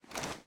Weapon Foley; HQ FPS Weapons; Wieldables

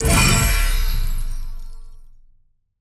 Craft Success; SoftKitty; Inventory Engine

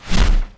Sort, Inventory; SoftKitty; Inventory Engine

